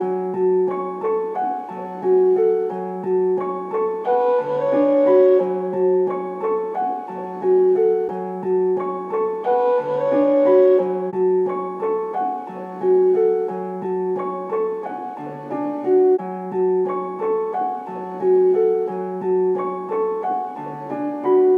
Dark Melody Loop 89 BPM F# Min; Cymatics; Oracle Sample Pack

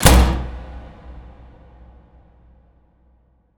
impact hatch; Hits

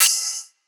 Hihat; Ghosthack Advent Calendar Day 4

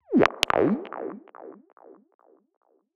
Squelch A# 142 BPM, Psytrance; Ghosthack; Psytrance Visions Volume 2

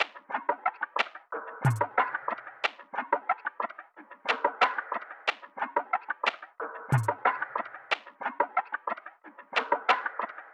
Percussion Loop 91 BPM; Percussion Loops

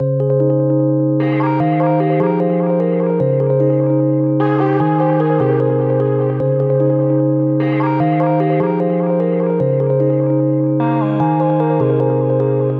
150 BPM F Min, Hip Hop Melodies Nostalgic; Alive

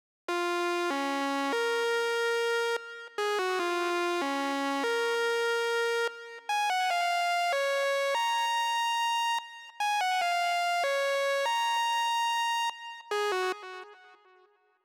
145 BPM D# Min Lead; Loop Stems; Cymatics; 2022 Melody Collection - Wavy; WTF

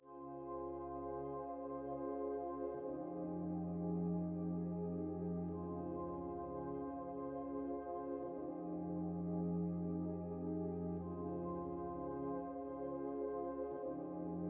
Pad, 175 BPM F Min; Cymatics; Infinity Melody Collection; Lovesick

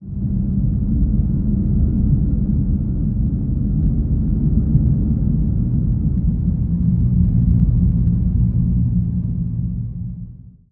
Dark, Deep, Dark

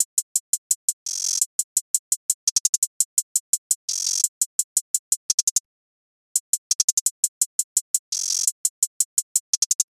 Drum Loop - 170 BPM Hihat; Cymatics; Anthem Drum Loop

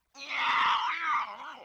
LoFi Synthetic Bird Caw; Vintage Cartoon; Comedy Vocals

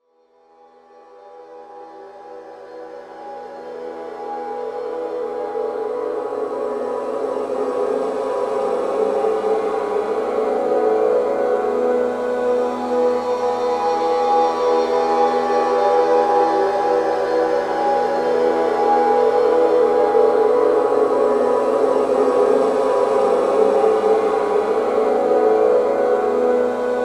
Pad E; One-Shots; Pads; Ghosthack; Color Bass Essentials

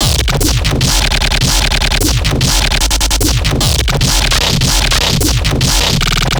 Bass Shot 150 Robo Vomit, dubstep; Dubstep Basses; Loops; GhostHack; Advent Calendar 2021

